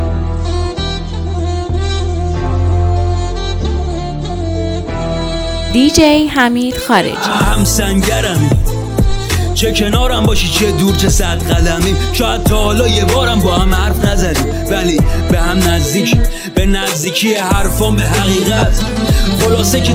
sangarmi remix

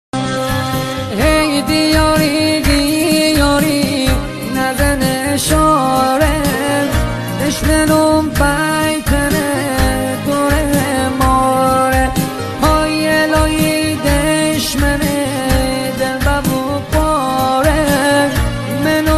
remix remix

